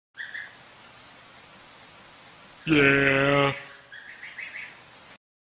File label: Yeah lamb